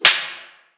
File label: Slap